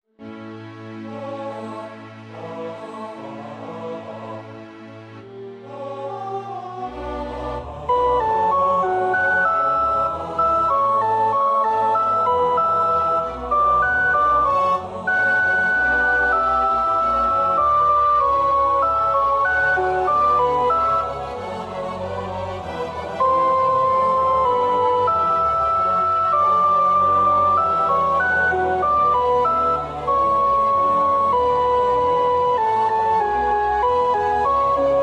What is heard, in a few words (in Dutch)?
sopraan, koorpartij oefening; Bach (Johann Sebastiaan); BWV 245 Johannes Passion